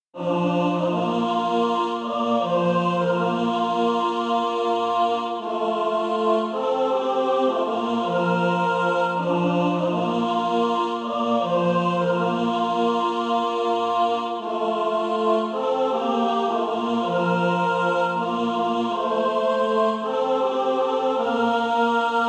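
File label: alto, Practice Music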